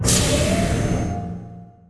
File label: door move; svencoop